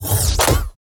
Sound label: transition shutter; Alexander Kopeikin; Transitions HD